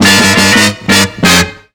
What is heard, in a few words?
Horn Hits, Drum N Bass; Sonic Foundry (Sony Creative Software); Knutrix Drum & Bass